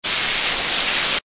firehose spray

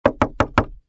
knock; Toontown Online

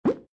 balloon popup

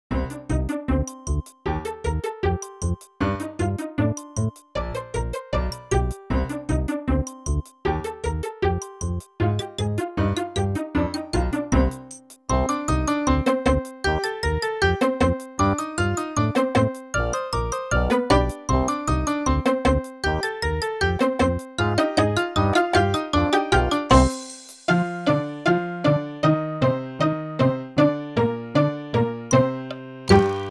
encntr general bg indoor; toontown-ceiling

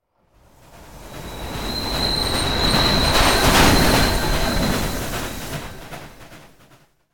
TRAIN pass

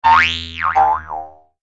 toon bldg settle

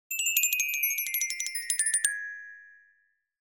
freeze; toontown-ceiling

